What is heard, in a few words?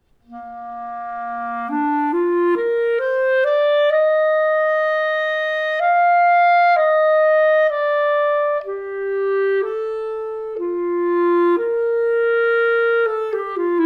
Metal Clarinet